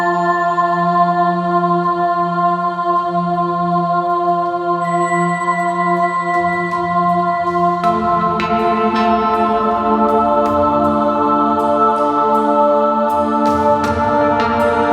Reliquary Activate Cinematic

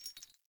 Grunt Foley Movement Chains

